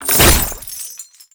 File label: Catenach Chain Detach; Roathe Summon; Warframe; Tau